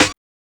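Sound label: SD, snare drum; X-Static Goldmine 1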